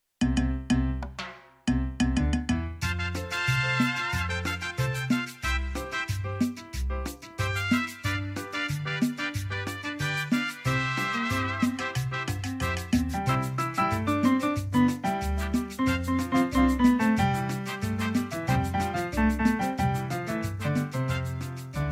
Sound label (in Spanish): Santanero, ritmos, tropical